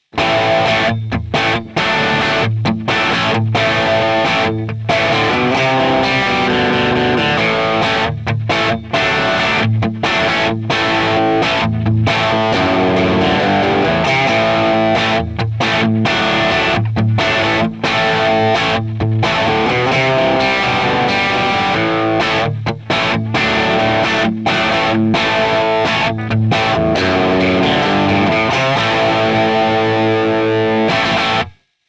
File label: Epiphone Les Paul Bridge Distortion